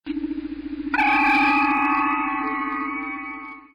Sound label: transporter; travelnet